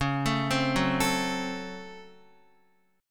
Db Major 7th Suspended 4th Sharp 5th Db Guitar; x,4,7,5,6,4; DbM7sus4#5 arpeggiated; JGuitar

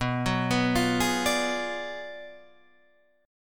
B Minor 6th Sharp 11th B

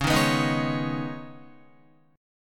Db 7th Suspended 2nd Db, down, Guitar; JGuitar

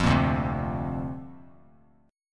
D-Minor 7th Sharp 5th D Bass Standard, chord; Dm7#5; strummed

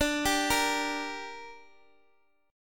D Suspended 4th Sharp 5th D, down, Guitar DADGAD

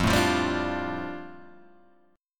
G 7th Suspended 2nd

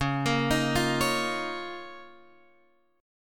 Db 6th Add 9th Db down Guitar Open F; Db6add9 arpeggiated; JGuitar

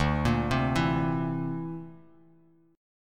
D 7th Suspended 2nd Sharp 5th D, Bass Standard; D7sus2#5 arpeggiated; JGuitar

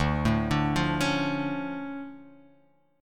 D Minor Major 7th D down; DmM7 arpeggiated; JGuitar

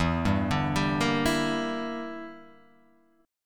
E Minor 7th Flat 5th E

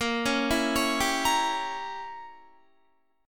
A sharp Diminished 7th, Guitar; A#dim7; arpeggiated

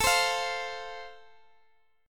A Minor Sharp 5th, Guitar, Open G

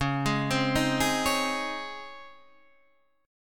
C sharp Major 7th Suspended 2nd

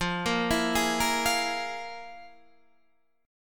F Minor Major 7th Double Flat 5th, Guitar, DADGAD

